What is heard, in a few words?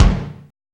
TOM